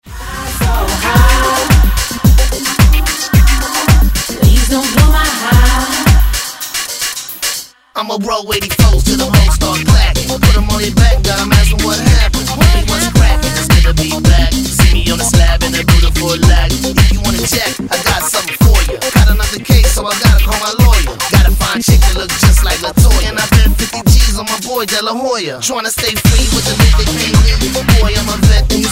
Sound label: Club Hip Hop